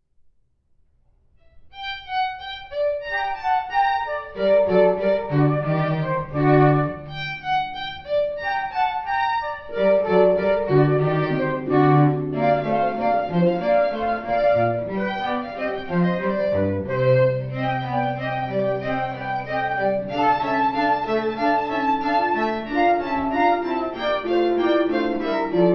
Allegro from Concerto Grosso No. 8 Corelli, string quartet